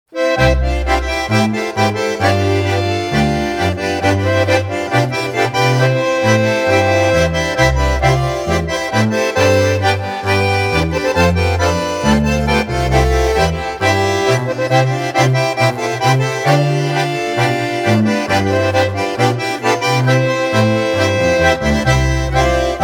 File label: Polka